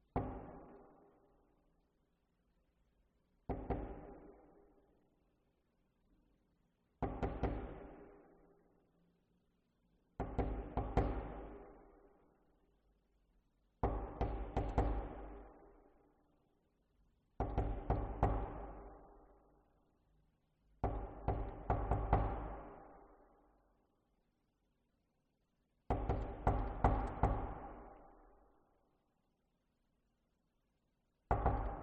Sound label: Door Abandoned Mansion Hallway Perspective Reverberating Old Wooden Door Knock; Knocking; Skyes Audio